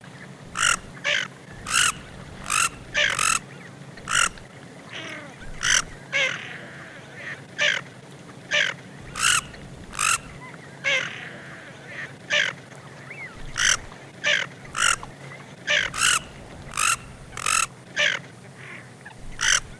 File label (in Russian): Чернеть Хохлатая Moretta; plurifon